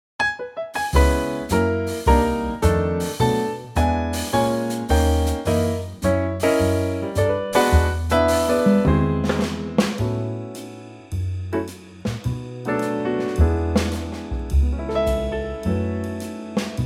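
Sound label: A Trio, Backing Tracks for Male Vocals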